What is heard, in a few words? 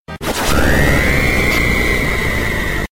soul scream; marx